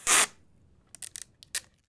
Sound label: SEL TAPE, STATIONERY; AKAI S6000 CD-ROM - Volume 6; Tools